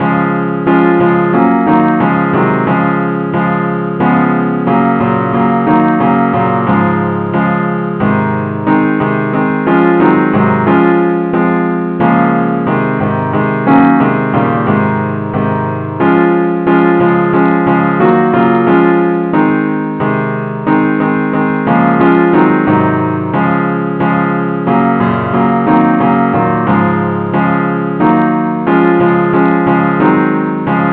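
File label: advance accompaniment key D flat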